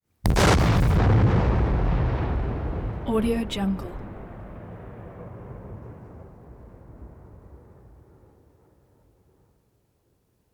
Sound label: explosion nitroglycerin big explosion